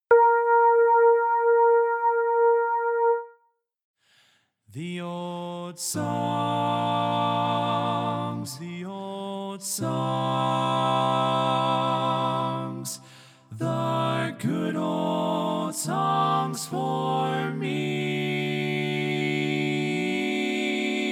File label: The Old Songs Tenor, TTBB